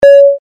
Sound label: clock